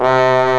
WINDS & BRASS

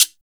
GUIRO1 DOWN; Drums In Your FACE!; FILM KIT2

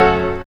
stone, ragga samples; AMG - Now CD-ROM (Roland); Soun'Bwoy!

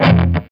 F#3 SOLDANO ROCK, Guitars

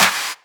Claps; Vengeance; Essential Clubsounds Vol.4

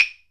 HYOSHIGI, JAPAN PERC; AKAI S6000 CD-ROM; WorldSounds

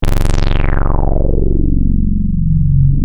Prophet 5 F#1; Hollywood Edge - Giorgio Moroder Rare Synthesizer Collection

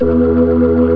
B3 E 1-4

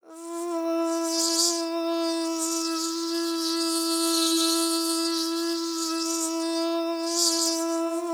BUGS, VOCAL FX; Spectrasonics Vocal Planet; Jazz FX

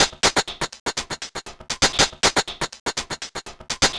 Hats Beat, Electronic Beats; Sonic Foundry (Sony Creative Software); Myths of Technology

